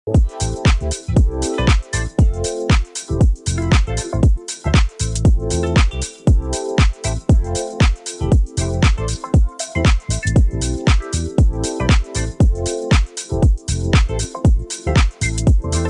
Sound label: a house music song with funky beats; music fx